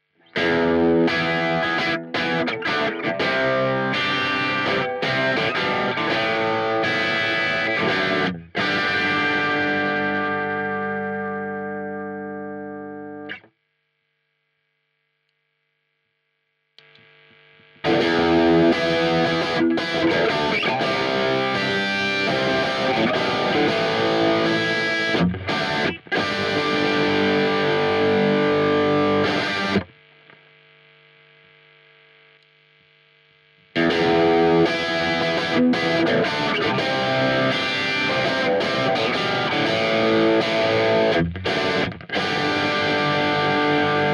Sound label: KATANA MARSHALL